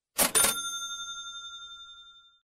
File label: cha-ching